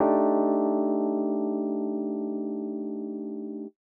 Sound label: 125 Rhodes A, Keys, Nu Jazz House; PowerFX - Nu Jazz House